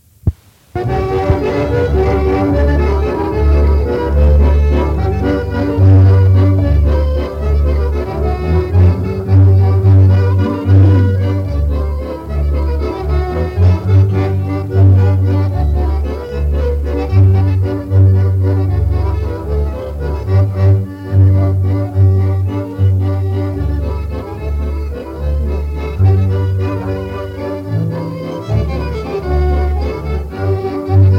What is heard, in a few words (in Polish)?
Instrumentalny